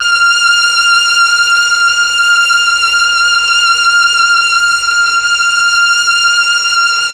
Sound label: MEL STR, Legendary M400; ILIO; Propeller Island